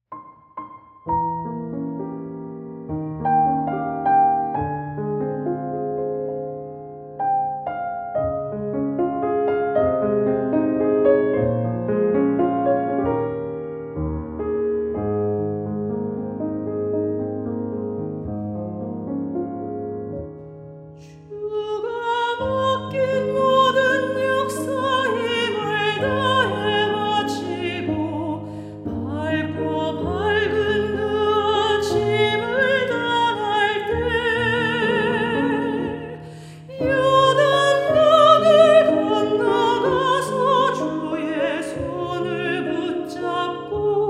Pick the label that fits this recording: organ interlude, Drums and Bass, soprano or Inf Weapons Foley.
soprano